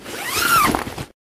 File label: bag close; firefight